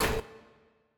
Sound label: hit; blackjack